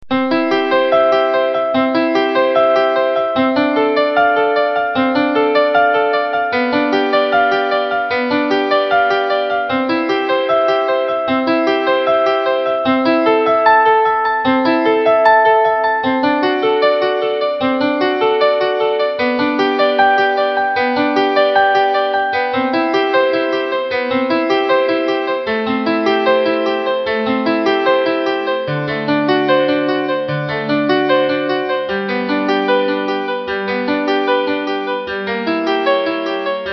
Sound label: BWV846